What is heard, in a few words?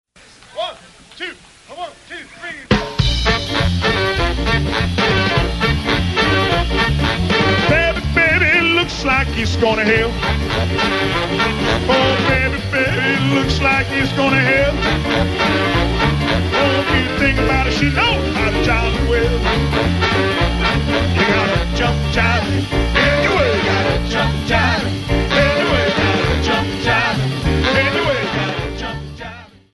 Jump Blues